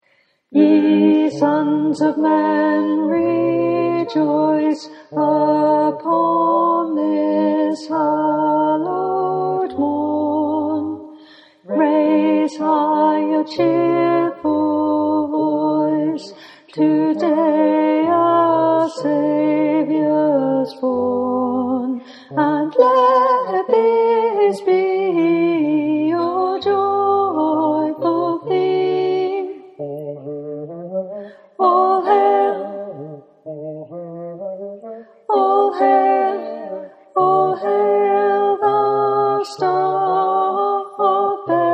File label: Alto